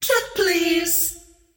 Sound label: scream; queenofpain